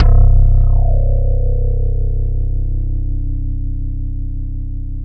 JV-1080

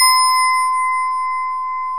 DIGITAL SYNTH; OMI - Universe of Sounds

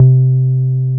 303 SUB C4P, SUB BASS

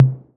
TOMS 808; Wizoo - Platinum 24 Electronic; CHR TOMS 808

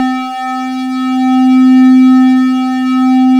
C3 LEAD; AKAI S-Series CD-ROM Sound Library VOL-8

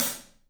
PAISTE HAT, Drums; 3D Sound

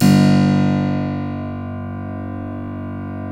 G 2 CLAV; USB Soundscan vol.17

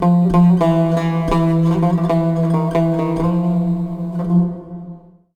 SIERRA GUI

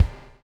BUBL, BUBBLE; Spectrasonics - Backbeat

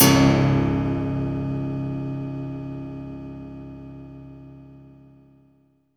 PIANO WIRE; Spectrasonic Distorted Reality 1

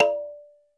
KALIM DRUM, TONAL PERCS; Crypton - DrumBase5000

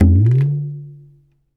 African Perc

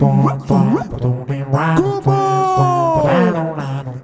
VOX, Roots Disco; USB Soundscan vol.32; AKAI

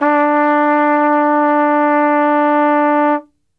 D 2, Brass; Best Service; Brass Super Section

